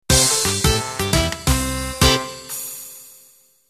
Flapper end

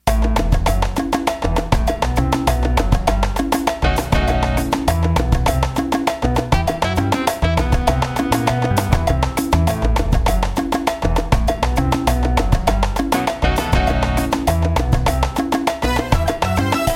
Salsa

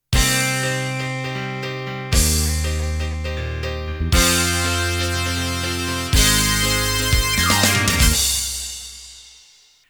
Fire Rock Intro

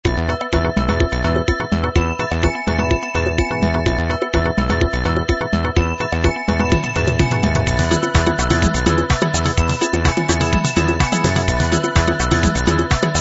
house

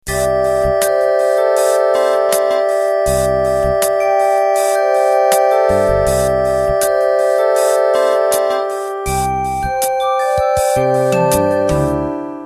16 Beat Slow 70s Intro, MIDI; #16slo70.sty compatible MIDI file Intros and Endings

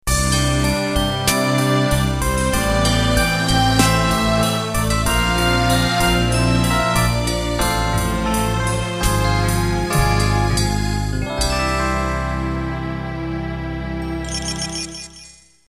Animation End

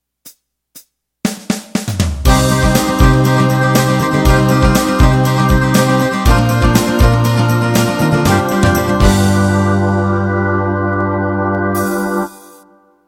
Country 16 Beat Intro

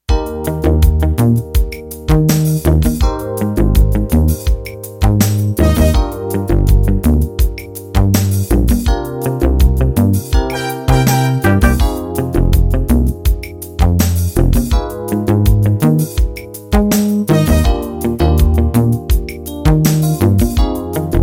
16beat

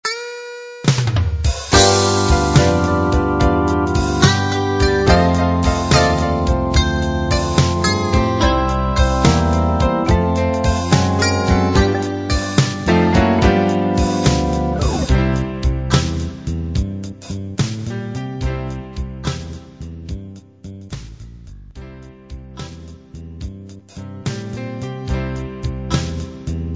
6-8 Blues

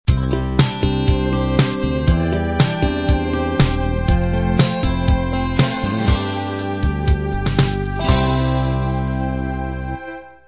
pop beat end

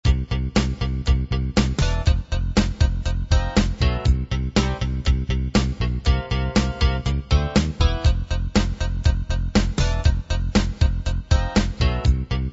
heavy rock

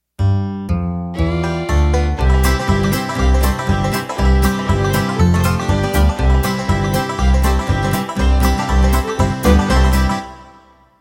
BlueGrass Intro

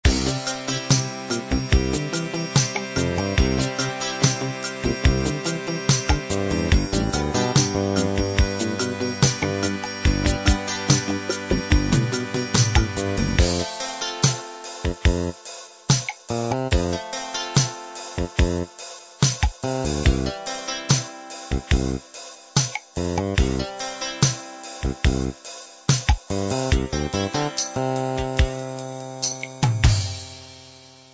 rock ballad

